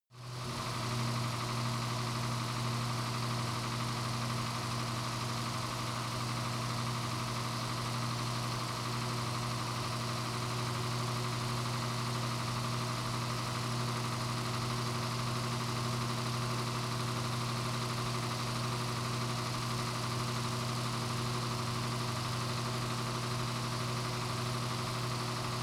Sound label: car camaro idle faster engine